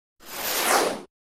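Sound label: meteorite asteroid sounds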